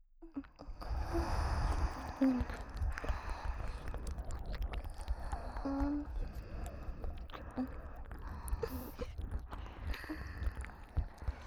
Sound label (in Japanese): 耳舐め責め; サファイアのみ